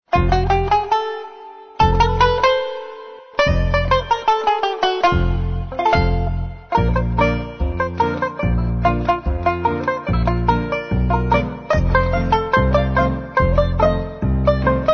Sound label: Ragtime Dance, ragtime banjo